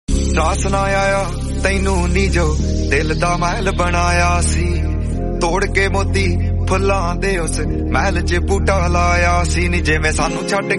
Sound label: reverb lofi